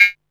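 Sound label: MISC Right, Techno 138 BPM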